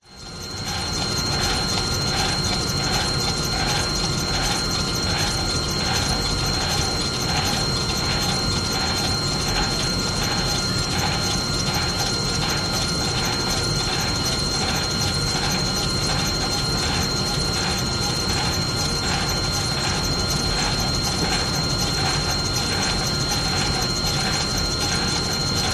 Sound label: industrial machine whirr crackle hum heater basement school